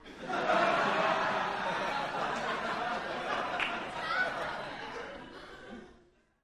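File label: Crowd 100 Hearty Burst, medium interior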